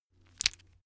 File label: stone gravel clatter hit; natur-tiere; steine